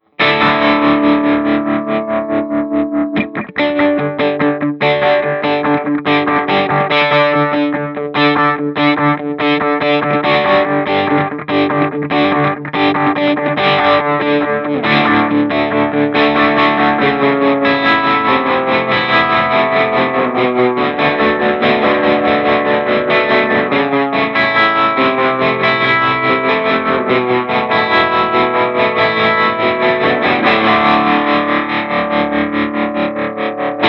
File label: trem la de da, tremolo; diamond pedals